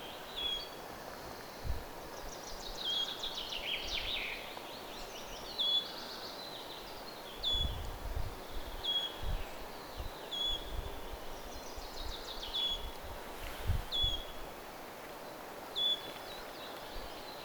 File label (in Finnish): sirittajan huomioaantelya